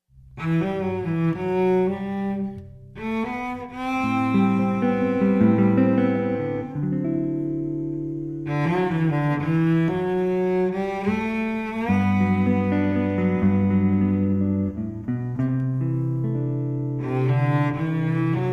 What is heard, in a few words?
String Duo Jazz; Guitar